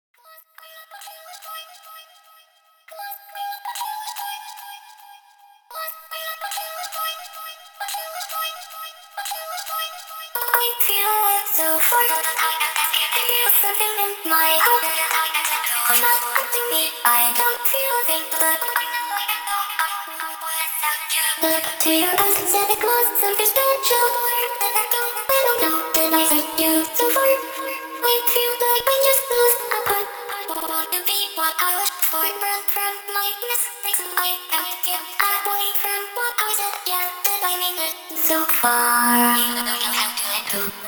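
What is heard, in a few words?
vox male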